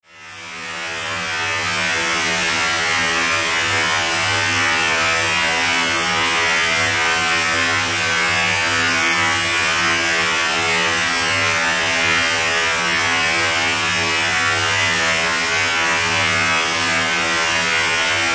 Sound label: 2251hz peak pinknoise